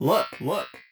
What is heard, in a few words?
SNES PCM